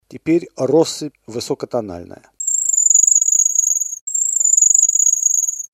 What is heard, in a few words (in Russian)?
rossyp